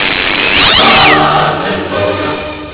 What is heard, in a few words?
Cat Shock